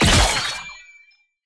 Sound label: attack heavy end; bloom minigame